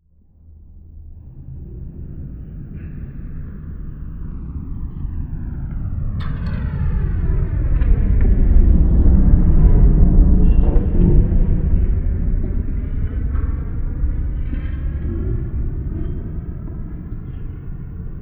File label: CRUISER, Anime Sound FX, FLYOBJECTS